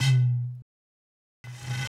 bottle, ethno, 125

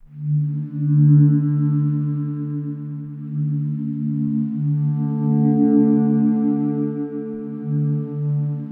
Analog Blur C 115bpm, Ambient Textures; Touch Loops; Ambient Textures - Mini Pack

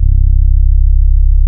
MUMM BASS, SYNTHBASS

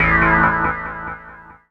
KEY SHOT TEKNO 140 A; Techno2; Singleshots

